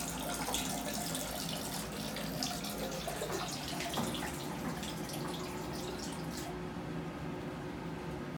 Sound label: pee sound